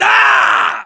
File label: melee charge, voiceovers